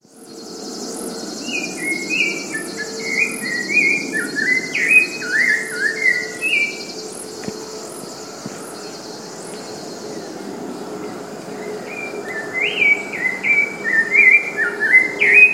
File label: Turdus rufiventris; PE Cantareira SP